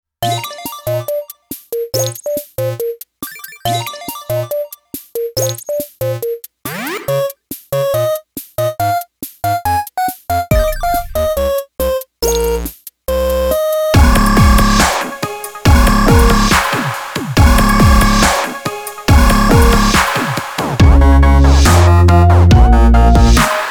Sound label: SYNTHS CHIP STEP